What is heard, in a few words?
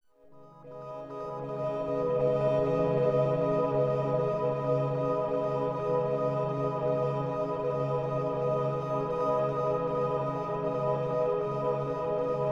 Overlay Drone